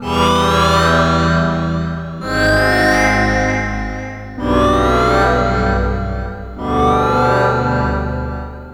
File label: Synth Pattern; Electrocution